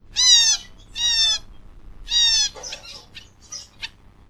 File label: owl finch call